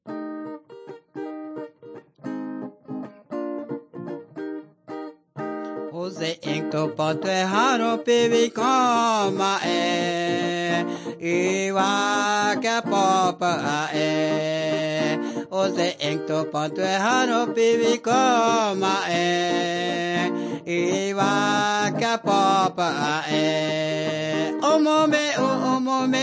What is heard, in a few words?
singing to god